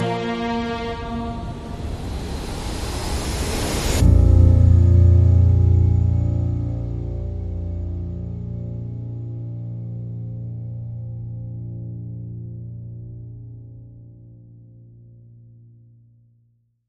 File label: Hybrid Digital Orchestra Stinger; bbc